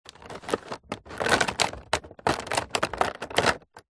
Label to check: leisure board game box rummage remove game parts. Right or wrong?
Right